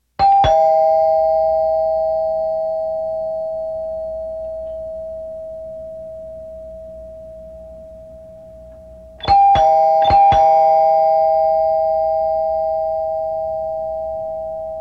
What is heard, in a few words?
Doorbell short